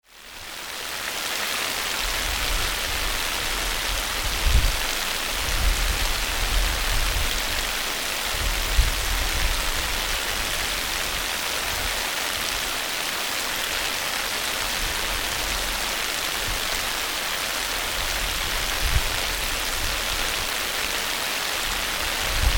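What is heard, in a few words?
earthquake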